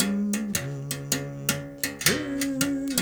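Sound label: 79 bass berimbau phrase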